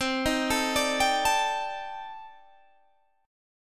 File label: C Minor 6th Add 9th, C, down, Guitar, Open G